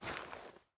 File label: armor lite; smite; lancelot